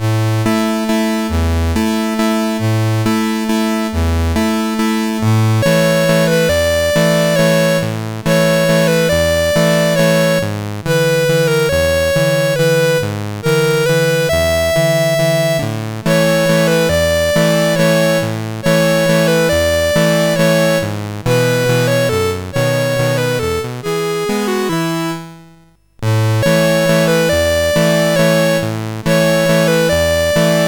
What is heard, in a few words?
Swan Lake Waltz, CSG8580R5